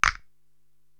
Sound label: Snap Crunchy, Korg TR-Rack